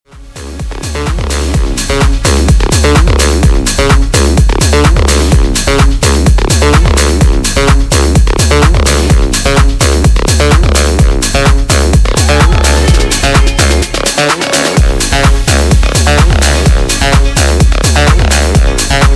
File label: acid remix